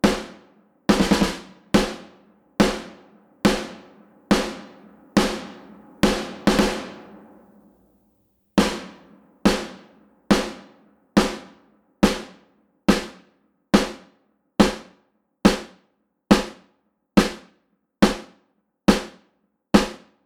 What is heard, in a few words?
snare room